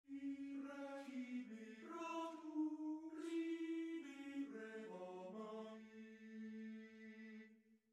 Frammento vocale su testo francese; mon maitre de qui

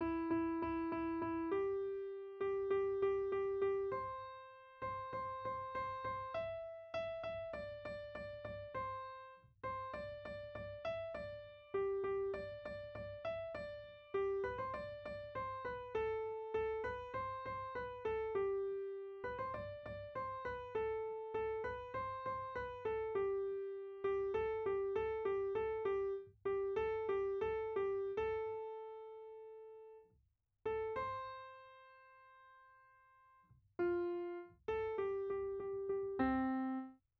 Melodia studio

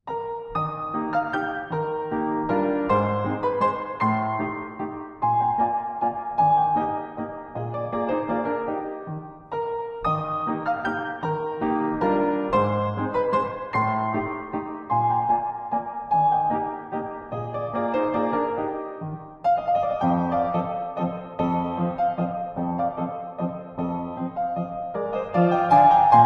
Valzer pianoforte; von B. Randhartinger

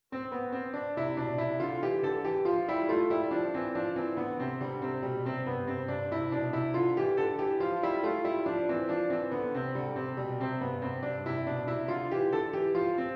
Passo a canone pianoforte

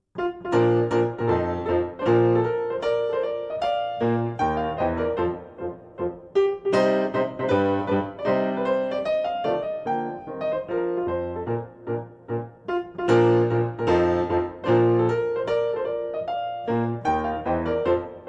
Marcia per 6 strumenti a fiato trascrizione per pianoforte

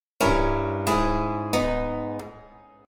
Ritardo per l accordo di quarta e sesta

